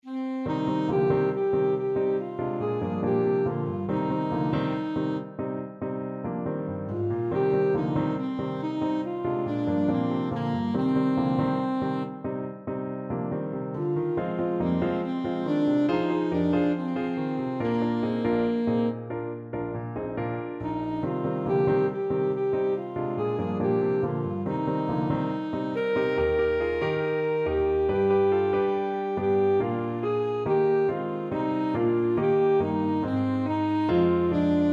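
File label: rock alto saxophone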